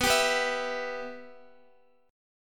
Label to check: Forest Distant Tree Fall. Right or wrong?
Wrong